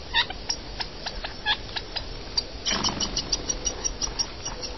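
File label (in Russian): разные звуки перепёлки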